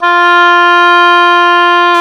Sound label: OBOE, Orchestral Winds, Oboe mf f